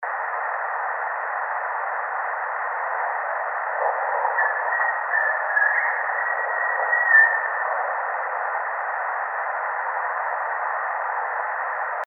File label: Whistle